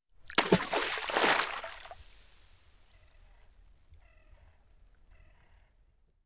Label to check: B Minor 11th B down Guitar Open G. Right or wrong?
Wrong